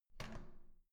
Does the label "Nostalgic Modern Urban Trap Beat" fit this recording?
No